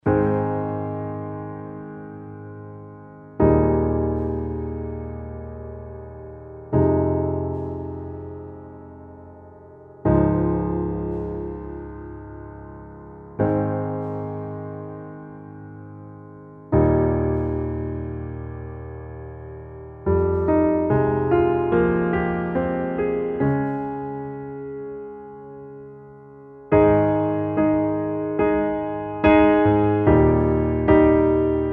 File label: Underscore PIANO; Worship Flow